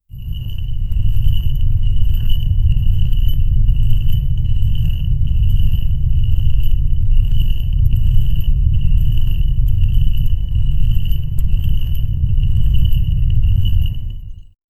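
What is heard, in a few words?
medieval dark fantasy ambience, dungeon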